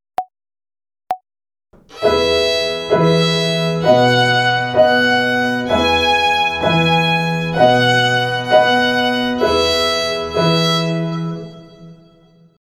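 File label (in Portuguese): Ginástica até o dedo 2 - Corda MI - Binária, Violino; Violino para os Pequeninos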